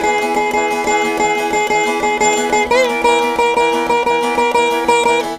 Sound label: VEENA; Spectrasonics; Heart of Asia